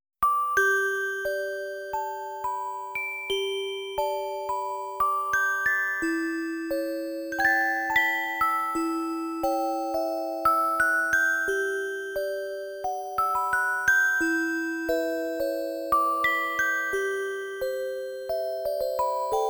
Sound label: Music Box; My Merry May Sound Collection